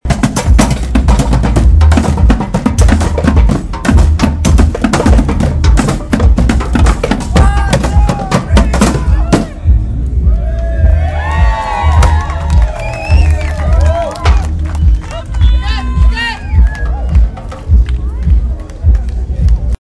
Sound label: drummers on cars